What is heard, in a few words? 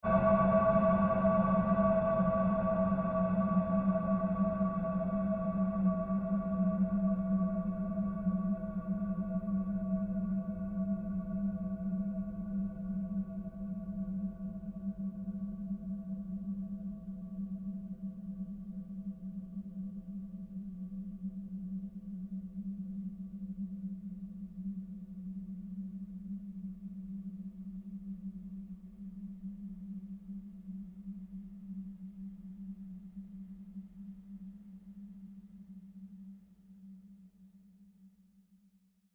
Created pulse fade long; sound building blocks created through manipulation of raw material; Rise And Dive